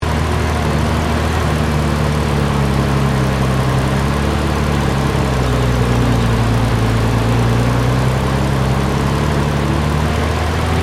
TANK CENTURION MANOUVER FLAT, British Centurian main battle tank; Truck Sound Effects; Trucks (Sound Effects:Vehicles)